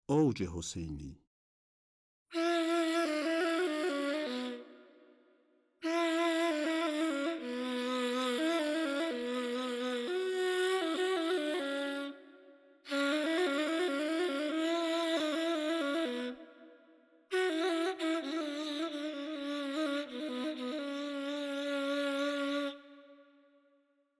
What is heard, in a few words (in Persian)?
Odj-e Hoseini Shur Radif-e Ney, Dastgah Shur